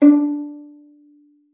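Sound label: violin pizzicato D5; Xsample VOL-5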